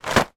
pushchair plastic rain cover drop on floor, household